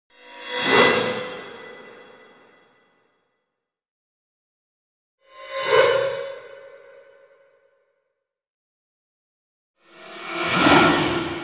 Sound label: Metal Cymbal with Echo Tail x3; Hollywood Edge Sound Designers Tool Kit